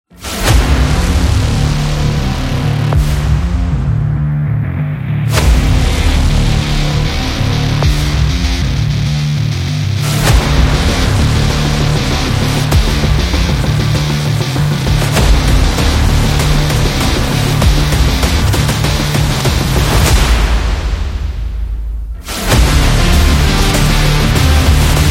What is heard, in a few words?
Fighting Motivation, electro rock